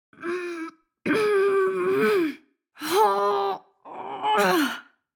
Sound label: Sfx Rail Road